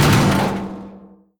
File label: door stop